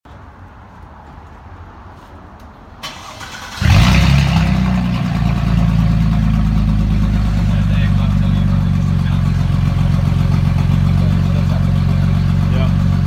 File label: exhaust cutout exhaust; c32 amg c55 amg w203